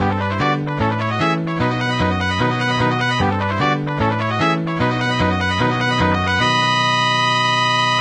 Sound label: Happy Message; Game Dev Story; Android; Mobile; Windows